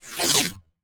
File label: digisweep medium, Mograph Mini Transitions; CinemaSpice